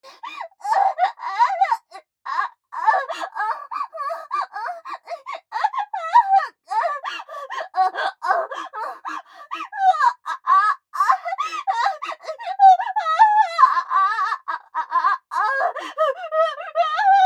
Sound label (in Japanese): あえぎ声・後ろ向き